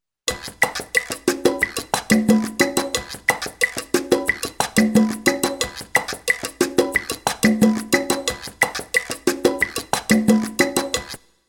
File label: Latin Lp; xp-80; roland; 180:Latin Lp 1 patch demo; roland demo cd-3